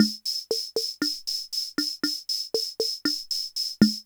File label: Loop, classic machines, rhythm77